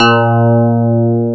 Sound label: PIA DX E-PIANOS